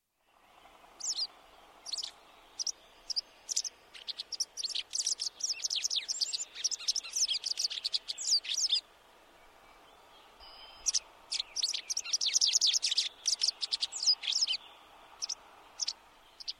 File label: White Wagtail song call voice sound